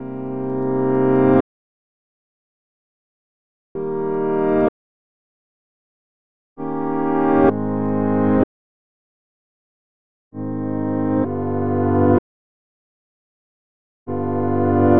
chords

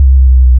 Sub, 808s

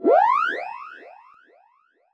TRAP TRANSITIONZ

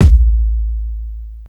KIK; Kicks

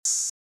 Open Hat

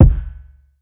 Wrong Kick; Kicks

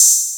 oh, Open Hats

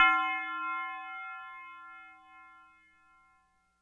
CHUCH BELL

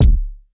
Kick

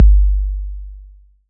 808's

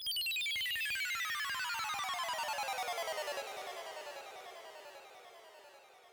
FX

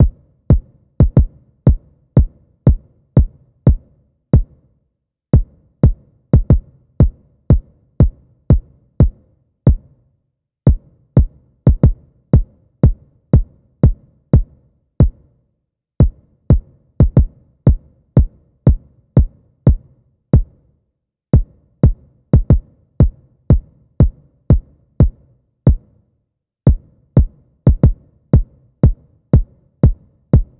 kick loop bounce kick 90; Kicks

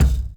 KIK TAL, Kicks

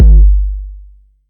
Not Nice 808's

